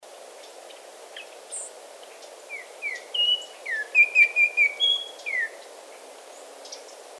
Altamira Oriole

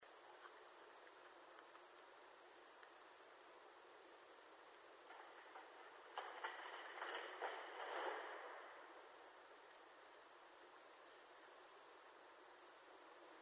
Tree falling

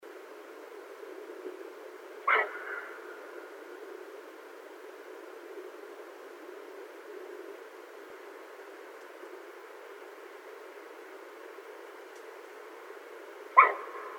Elk

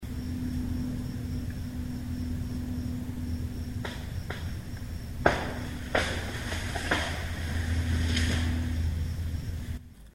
Tree Break; North Lake

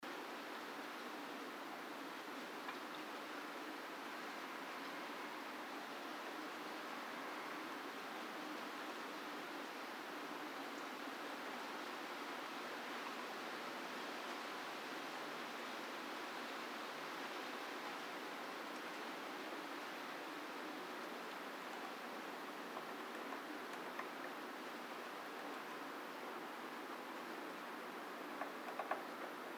American Crow

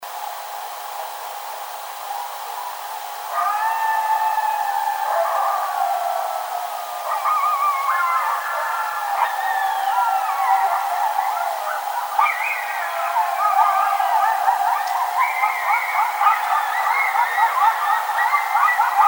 Illinois Howl unfiltered long version

